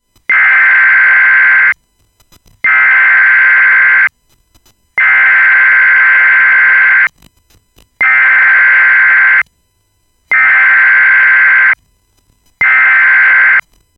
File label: tornado warning; natural disasters